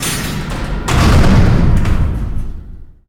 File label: Lift Large Elevator Door Close; Doors